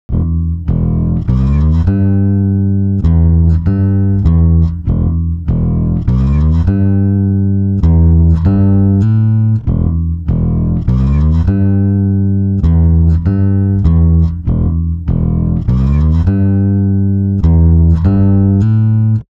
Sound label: bass, time stretch; prosoniq time factory clear scale